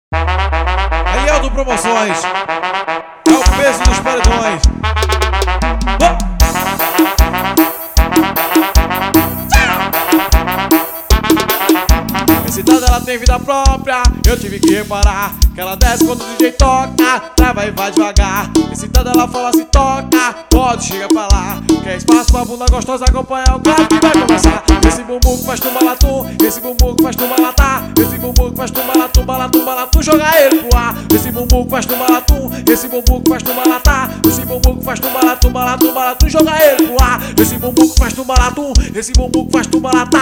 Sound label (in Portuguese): FORRO NOVO DE PAREDAO